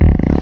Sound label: fart short